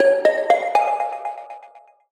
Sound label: tonal oneshot Db; Pop; Slate; PASADENA Pop Sample Pack; Tonal One Shots